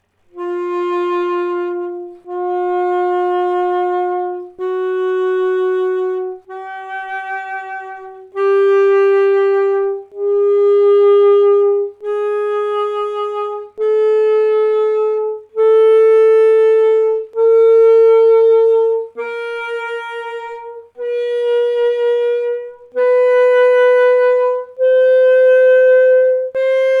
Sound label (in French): MONTEE EN QUART DE TON, quatuor